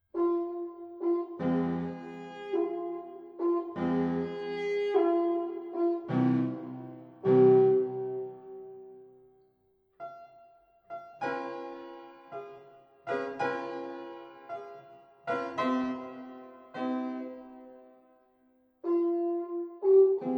Sonata in F Major Op17 Poco Adagio quasi Andante, Horn and Fortepiano; Ludwig van Beethoven